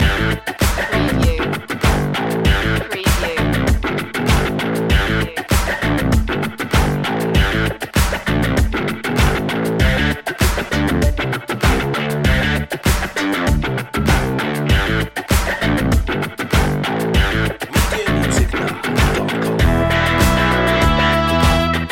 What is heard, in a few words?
blues funk fun loop; funky groovy